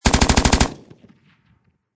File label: Machine Gun Russian Pkm Long Burst Close Perspective; LYN084 Guns & Military